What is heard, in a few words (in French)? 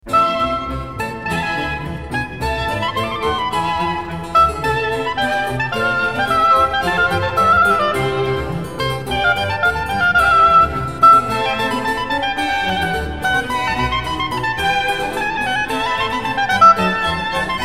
concerto pour hautbois, allegro; SCARLATTI (Alessandro)